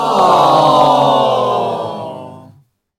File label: audience aww